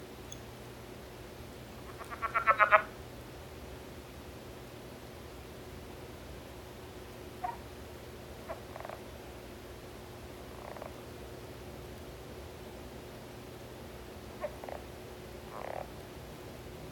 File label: Rana sphenocephala